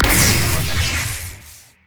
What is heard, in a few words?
shock blast; LoL; jayce